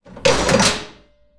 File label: smallcaliber shoot mechs; cannons; War Thunder